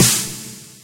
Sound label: Snares, Real